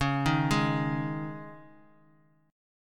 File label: Db Suspended 2nd; arpeggiated; Dbsus2; JGuitar